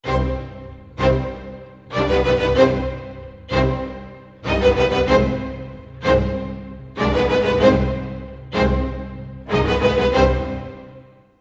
95 c min strings, Orchestral arrangements

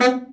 BRA Bari, SAX; Best Service Gigapack 1; BR SAX 2

